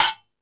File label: Tool Kit, Drum Machines E-MU Pro-Cussion